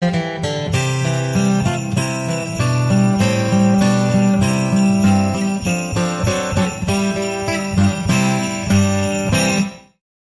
Deck The Halls

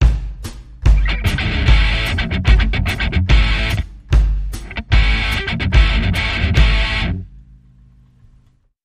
Breaker Action

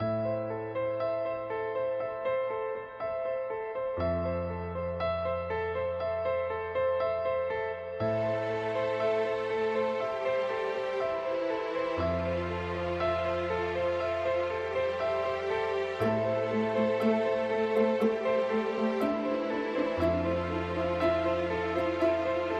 Orchestra

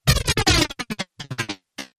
Vibrating Squidger

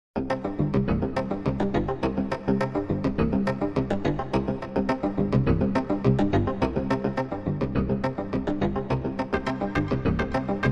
Rhythm

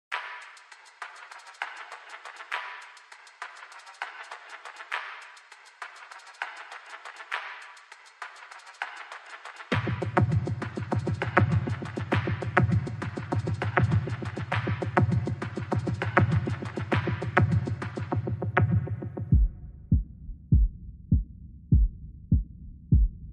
Beats

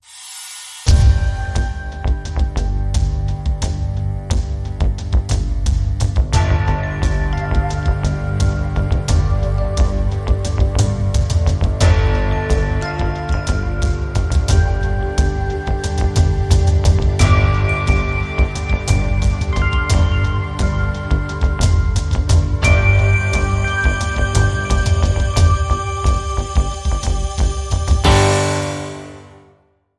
War Drums

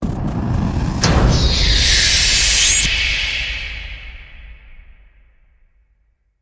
Hit Hor Detestable

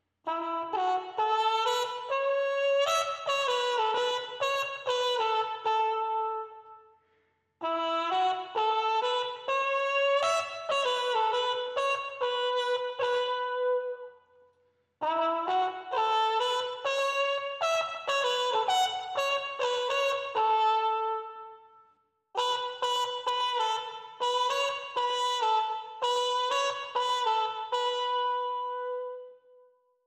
Trumpet

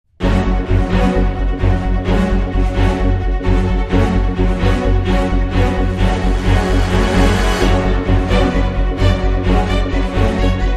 Dramatic Sting No Perc

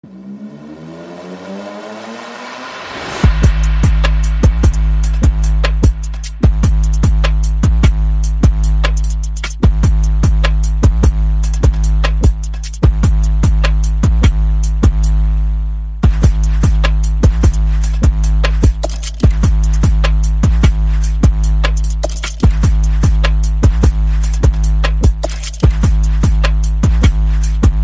Percussion Bass and Effects

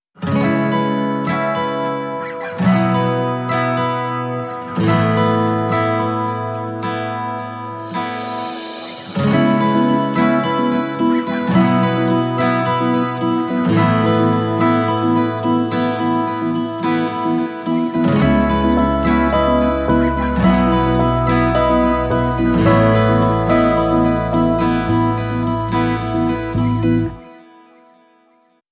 Bright Acoustic Days No Drums

